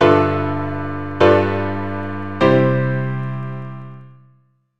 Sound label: G13 resolving, keyboard